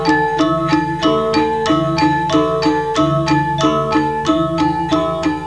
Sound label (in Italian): suonerie musica ritmica